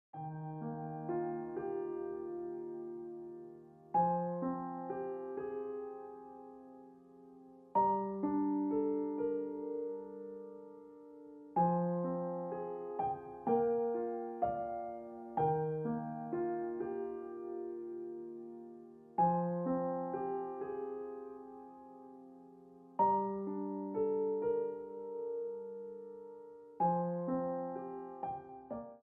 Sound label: Piano Music